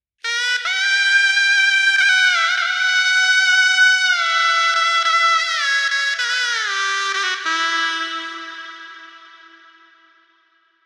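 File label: Shenai, melody, 4-4, E minor, 100 bpm, FX; Sample Banks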